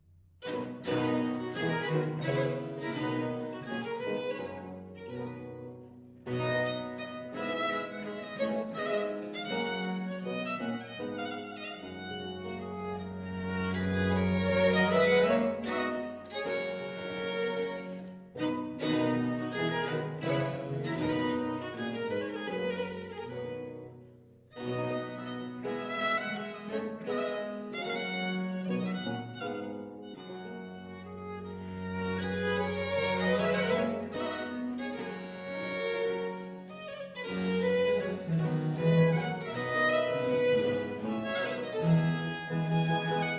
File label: Courante Suite No 4 in C Major from the Broken Consort; M Locke